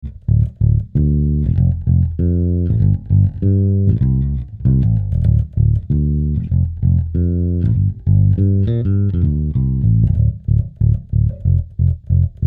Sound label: J Bass V Finger